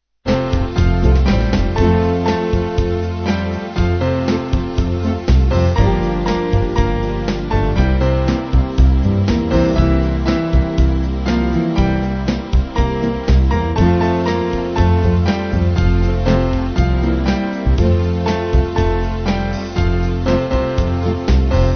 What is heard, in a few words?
Band